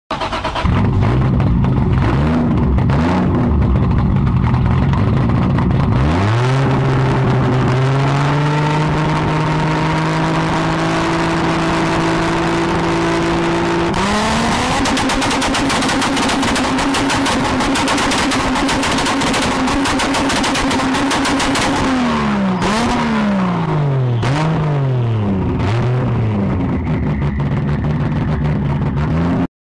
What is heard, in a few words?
supra mk 4 2 step; black widow; By Tuna